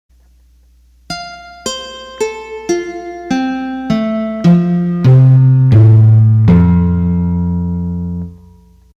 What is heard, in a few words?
Nylon Guitar, accordion